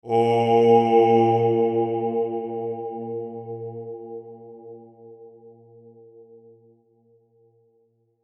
pure space vocal

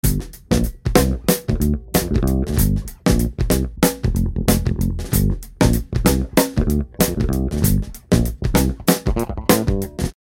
new orleans bass drum

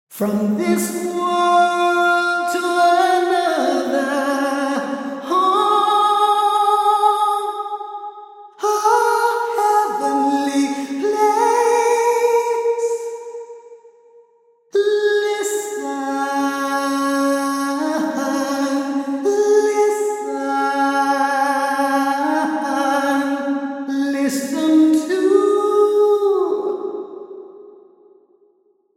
orch, dram hall

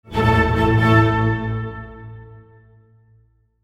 pure space orchestral cadence

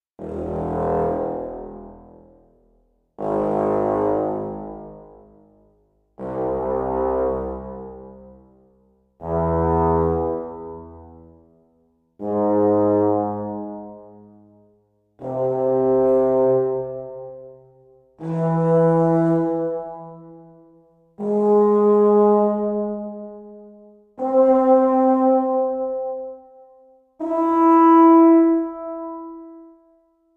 film soundstage

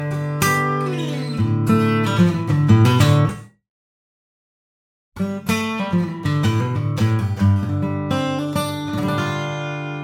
gtr

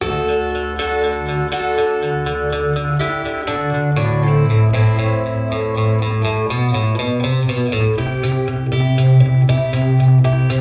hippie 195hz

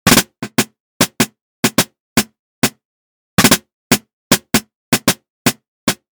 snare

